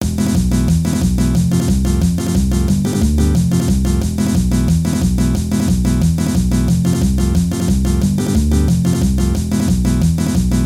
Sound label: Paso doble